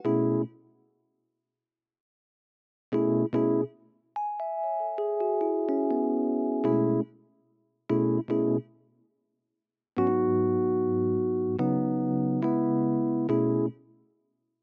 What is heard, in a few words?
rhodes, Smooth RnB, F#min